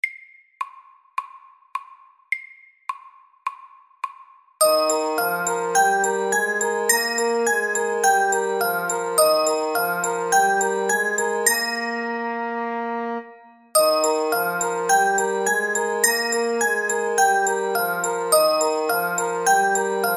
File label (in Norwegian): etyde FAST; Opp og ned etyde